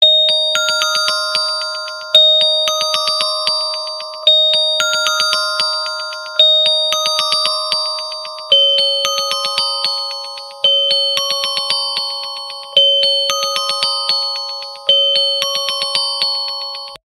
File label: xiaomi redmi note10 ultra ringtone